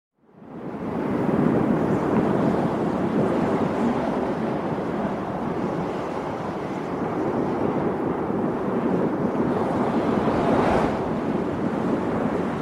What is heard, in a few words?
Heavy Wind Whistling